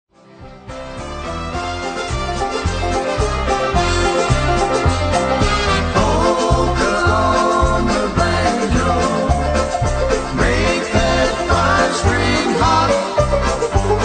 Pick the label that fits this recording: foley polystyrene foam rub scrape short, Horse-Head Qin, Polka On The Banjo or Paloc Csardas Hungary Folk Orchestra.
Polka On The Banjo